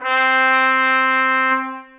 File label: Trumpet Solo Legato, Orchestral Brass; Ableton